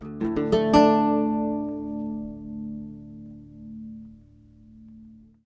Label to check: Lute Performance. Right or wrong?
Right